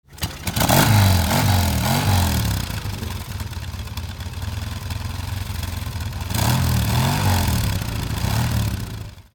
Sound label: norton startup